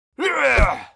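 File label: war attack, Mob; vaffernipper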